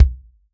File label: REAL DRUMS POP KIT; Best Service ProSamples vol.43 - Real Drum Kits